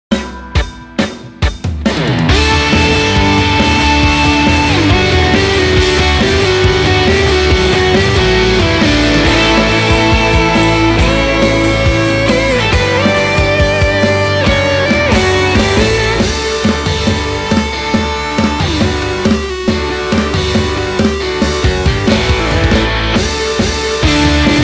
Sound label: stage performer